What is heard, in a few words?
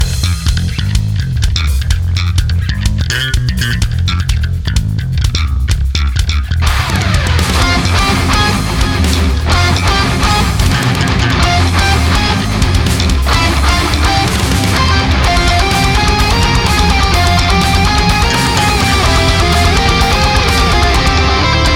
Deep Fear Octobass (Coral Riff); crypt of the necrodancer; aria awakened